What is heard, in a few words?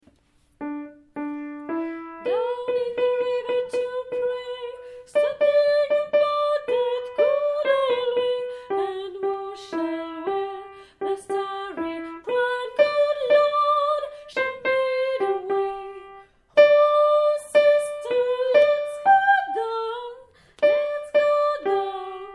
tenors